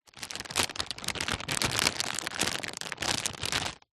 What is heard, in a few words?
foley packet packaging plastic rustle movement